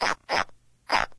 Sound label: antlion grub idle; video games; npc; source engine; ep2